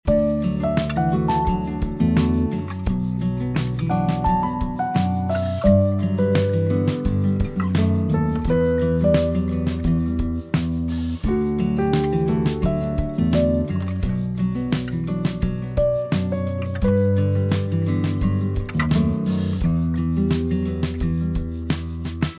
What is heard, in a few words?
Acoustic; IKEA Smart Home Planner; Kitchen - Dinner scene